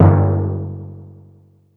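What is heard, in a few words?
Timpani Low, Yamaha DD-35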